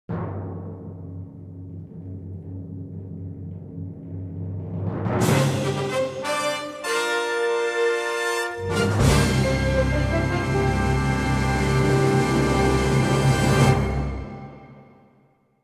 Fanfare; Network Production Music; NM102 Reaching For The Top (Multimedia)

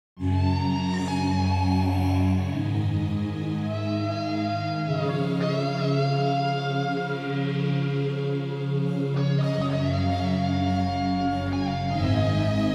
Rain Dance; Sound Ideas; Mix V

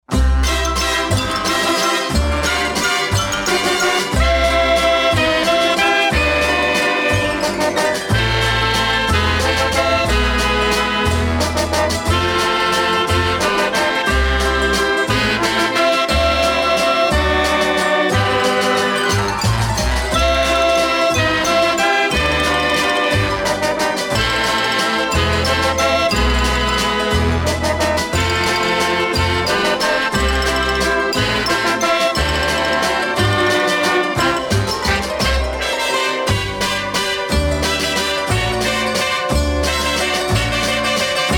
Hurdy Gurdy Waltz; KPM 1000 series

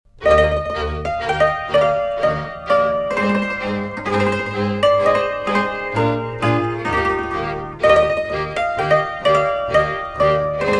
Recruiting Dance (Verbunkos), Hungary; KPM 1000 series; Music Of The Nations Vol.1, Hungary

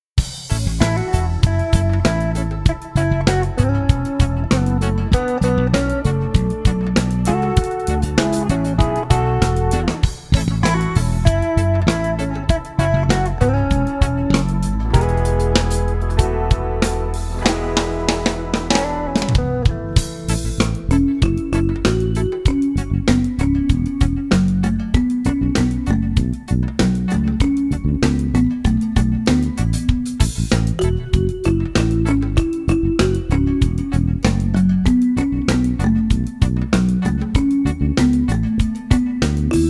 Reggae; Network Production Music; NM163 Fun n' Sun (Medium Tempo)

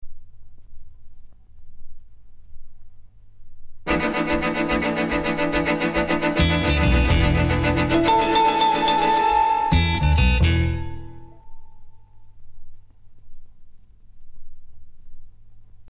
Hurry Up Sting, Augmented String Quartet

